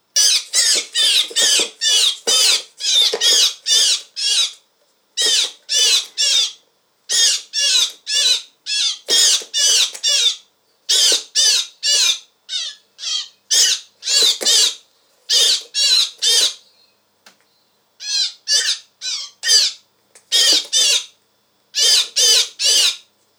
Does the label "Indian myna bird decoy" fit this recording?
Yes